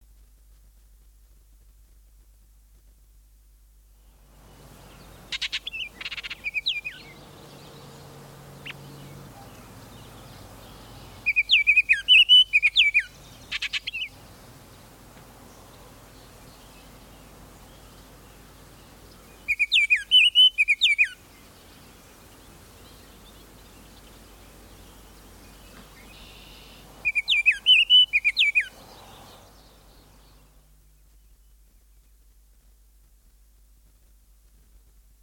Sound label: baltimore oriole, songbirds